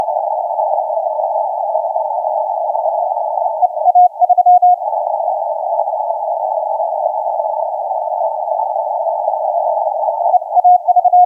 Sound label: 30m CW